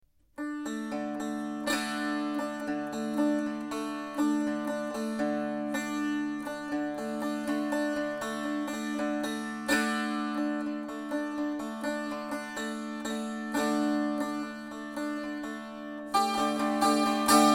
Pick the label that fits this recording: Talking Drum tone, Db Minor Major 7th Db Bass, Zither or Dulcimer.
Dulcimer